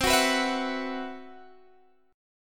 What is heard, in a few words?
C Major 7th Flat 5th C, Guitar Standard; CM7b5 strummed; x,15,16,16,17,14; JGuitar